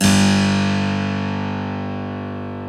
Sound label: Harpsichord